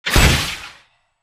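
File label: Drone Death Explosion Mid; Titanfall 2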